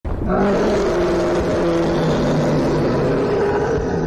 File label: roar